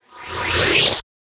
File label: Anime Laser Charging